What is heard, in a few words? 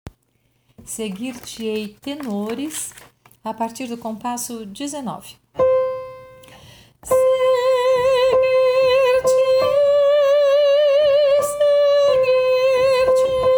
tenor, coral; coral sao francisco; missa vigilia pascal